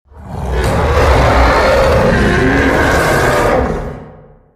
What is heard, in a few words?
wolf growl long